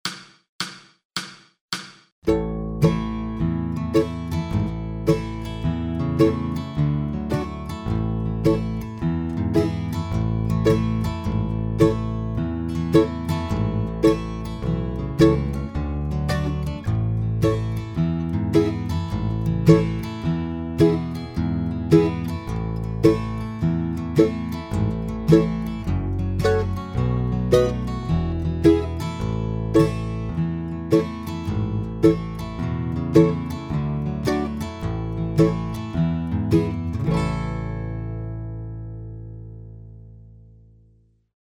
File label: rhythm grass slow; deck the halls